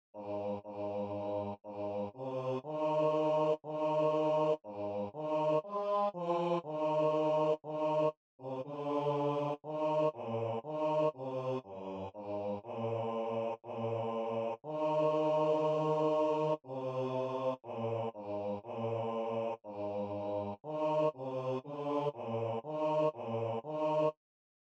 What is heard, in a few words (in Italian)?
bassi; Adeste fideles